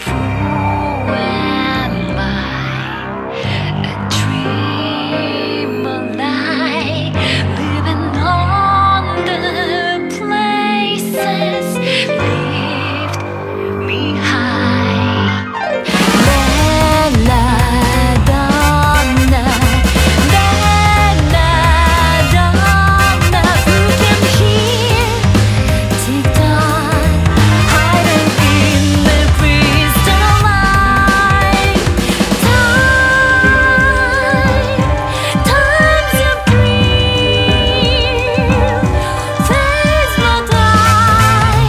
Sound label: loop vocal; Identity V